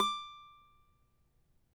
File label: CAVAQUINHO, Guitars; Spectrasonics; Hans Zimmer Guitars VOL-2